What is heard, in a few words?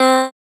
Dry One Shot C, Vocal, Tonal One Shots; Cymatics; Euphoria Vocal Sample Pack